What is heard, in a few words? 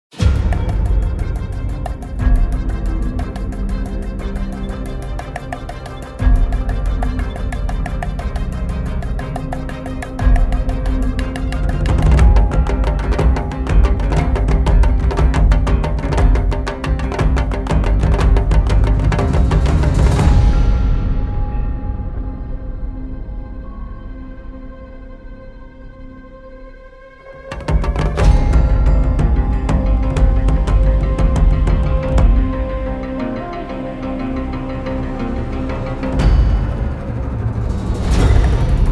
Ethnic Orchestra; JADE